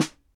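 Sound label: snare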